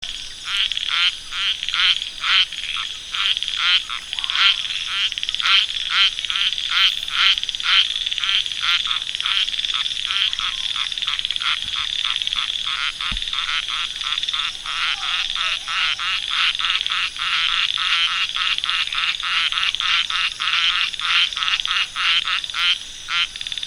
Microhyla butleri

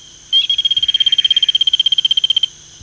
Oreophryne picticrus

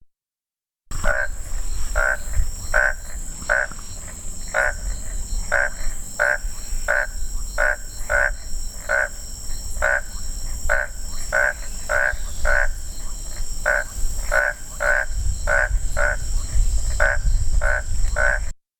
Boana pugnax

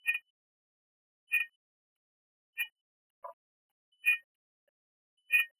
Xenopus amieti

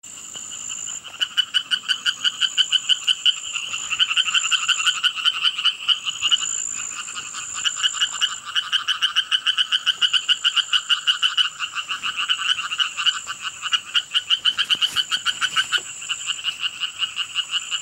Hyla orientalis

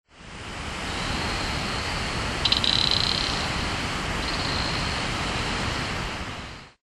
Gephyromantis klemmeri

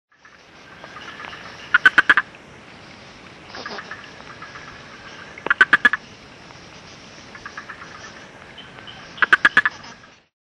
Guibemantis tornieri

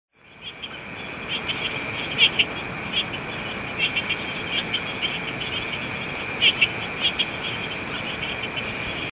Heterixalus tricolor

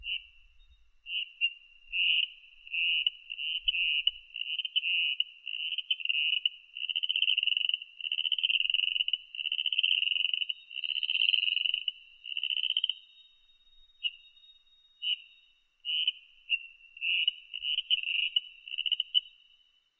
Dendropsophus luteoocellatus

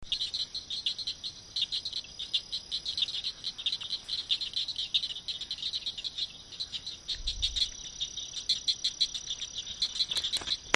Acris gryllus

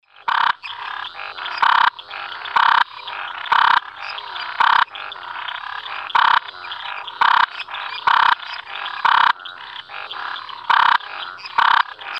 Scinax similis

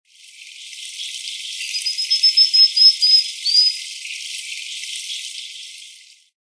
Boophis marojezensis

